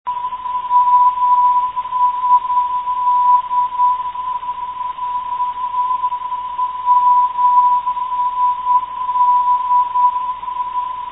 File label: msd 385khz, NDB